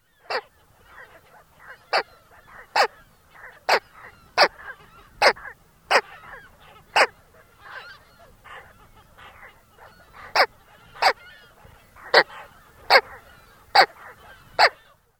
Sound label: American White Pelican